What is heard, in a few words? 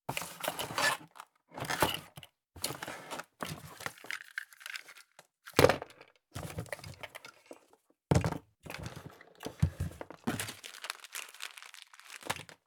box looting; Escape from Tarkov